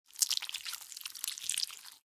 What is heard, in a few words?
Bloody gore squirting